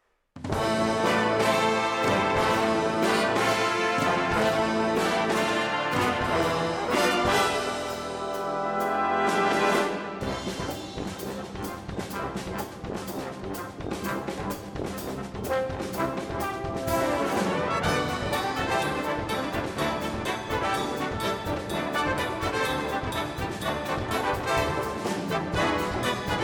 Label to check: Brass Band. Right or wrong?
Right